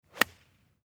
golf club iron

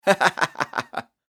laugh chuckle male

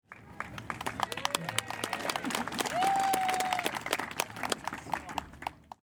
crowd cheers applause

